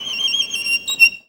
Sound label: VIOLIN BIRDS; Best Service; World Colours